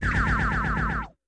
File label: Beam, Guns; PaxImp; PC Gamer 50